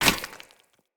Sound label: Sphere Polearm Medium Impact Rock; Player; Environment